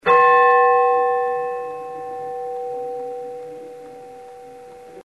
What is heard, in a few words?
bell sounds